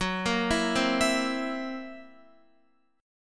F sharp 7th Flat 5th, down, Guitar; arpeggiated; 4F,4A,5C,5G,5C,6E

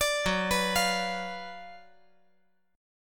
Gb Major 7th Suspended 4th Sharp 5th

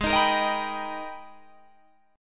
Minor Major 9th, Guitar, Open G; Gsharp

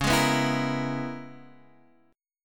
Db Minor 13th Db Guitar Drop D

